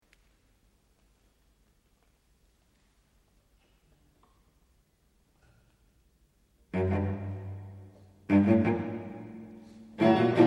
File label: String Quartet